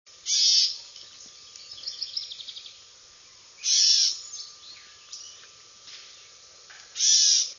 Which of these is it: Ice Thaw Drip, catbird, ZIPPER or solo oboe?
catbird